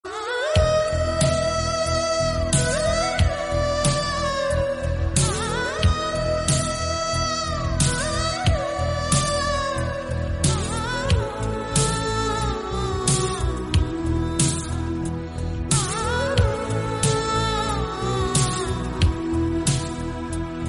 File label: Humming Ringtone